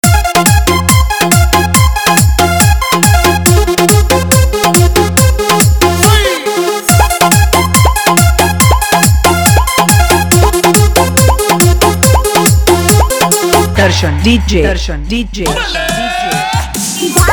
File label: Bhojpuri Dj Remix Songs Hard Vibration Mix